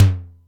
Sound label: SDS9 MT, Simmons SDS-9; SDS9 Factory A5